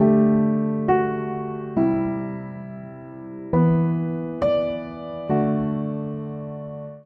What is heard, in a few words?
Progression VII-VI-i-VII E Minor 136bpm, Upright Piano; MIDI Clips; Circumstance; Upright Piano by Spitfire Audio; Ableton; Factory Packs